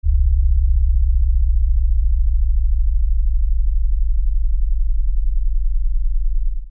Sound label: Lfe Descend Low Bass; Blastwave